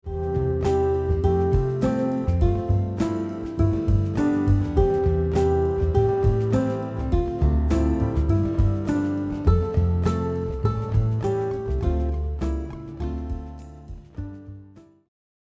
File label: guitar; Hark the Herald Angels Sing; christmas songs